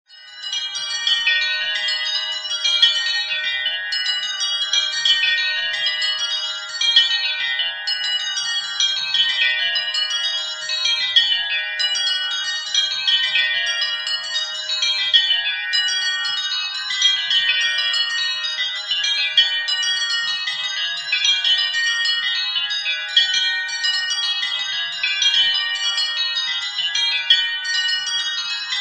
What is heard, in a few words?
Peal 8 extract at 2000 changes